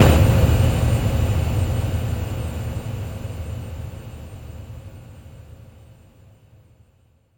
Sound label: Impacts, Effects; Vengeance; Essential Clubsounds Vol.4